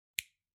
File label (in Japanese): 爪を切る音